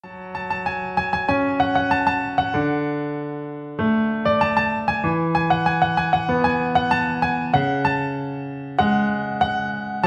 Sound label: piano cover